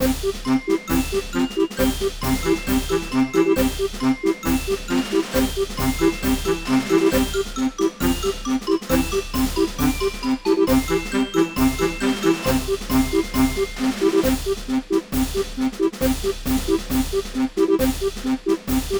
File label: Win; Carnival Games